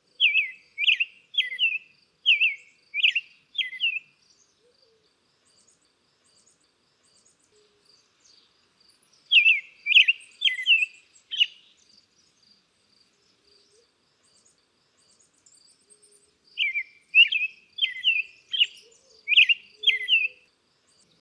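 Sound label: Robin American Song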